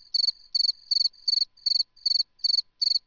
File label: cricket